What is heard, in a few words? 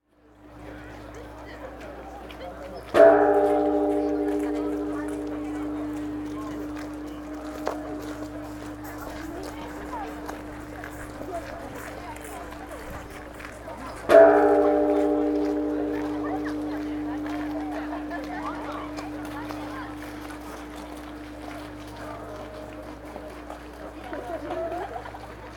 gong; NYE